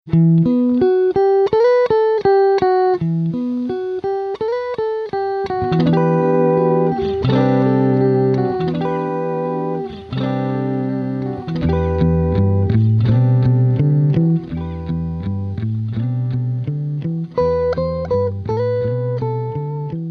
Fender Strat Rocktron Sacred Fire Hard Wire DL8 Roland JC120